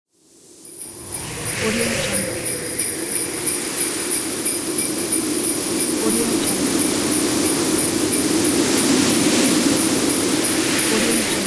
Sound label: winter wind sleigh bells; applehillstudios